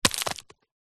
mud dried clump throw ground break; zapsplat; foley

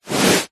foley hand on carpet single short rub; zapsplat

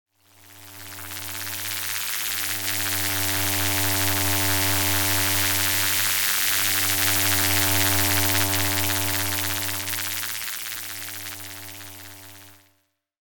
science fiction electricity beam

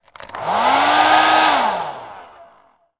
hairdryer on short burst off; household

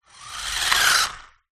leisure radio controlled car approach stop; zapsplat

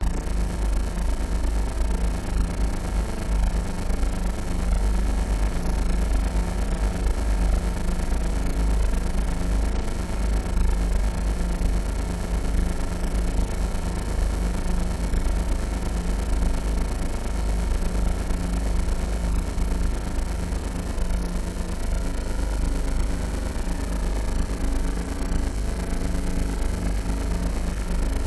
Plasma TV; soundbox library

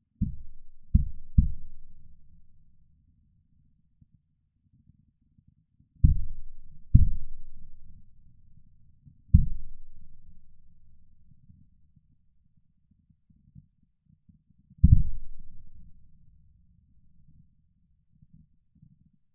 distant battle gunfire explosions booming

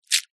animals insects worm drop

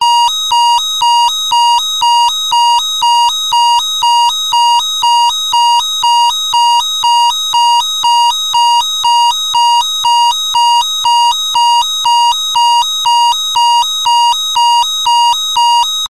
emergency alarm siren

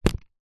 leisure playing cards loose deck set down carpet

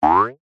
cartoon spring boing

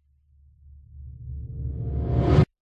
riser dark quick metallic reversed; sound design

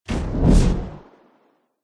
cinematic whoosh impact flare; zapsplat

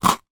human eat crunch bite biscuit cookie

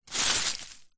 foley wood chippings handful pick up; zapsplat

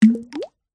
nature water small plop splash stone drop on

